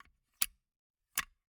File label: Gumball machine, candy door, plastic, open, shut, wide; Eiravaein Works; Vaeyan IV